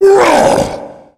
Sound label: tank attack, zombie vo; Left 4 Fortress